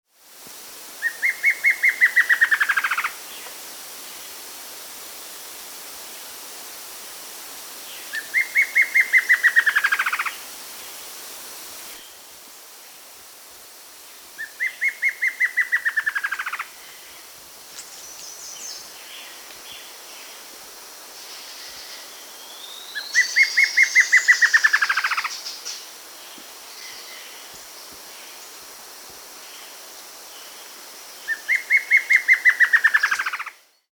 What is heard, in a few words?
Plain Antvireo (Dysithamnus Mentalis), Andean Birds; S. America